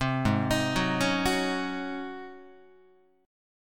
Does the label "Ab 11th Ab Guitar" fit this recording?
Yes